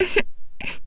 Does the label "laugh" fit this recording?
Yes